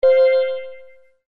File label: synthlead